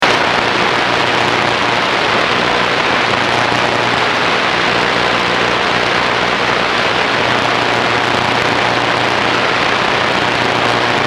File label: ww2 buzzer